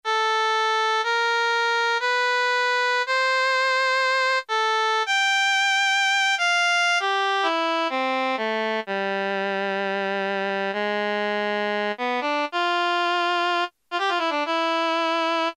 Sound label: Oboe, Roland JX-3P